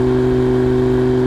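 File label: engine exhale, advanced engine sounds; engine2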